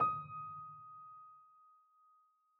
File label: piano sampler